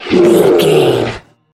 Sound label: troll monster battle grunt